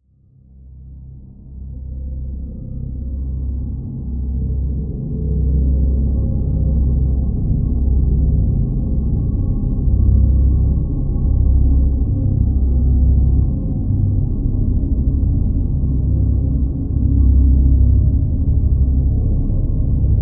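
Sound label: Drone Deadly C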